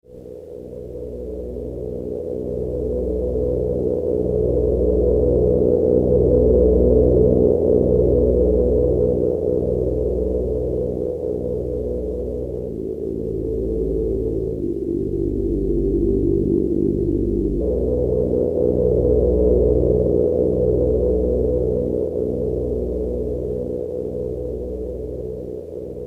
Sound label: space cruiser; OSCar